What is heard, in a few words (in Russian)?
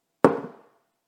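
стакан на стол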